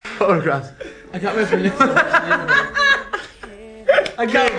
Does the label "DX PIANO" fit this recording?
No